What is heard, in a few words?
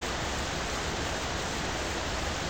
cave Amb; TOON64